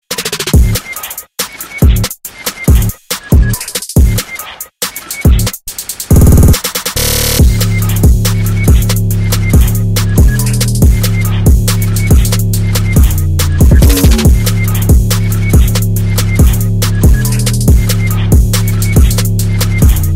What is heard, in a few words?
beat; Fortnite